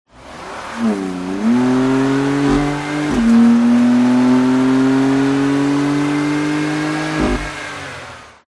TRANSPORTATION CAR BMW M4 Driving Accelerate Buzzing